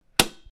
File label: Light Switch Click